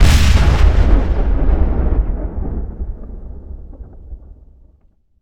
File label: solar, ships combat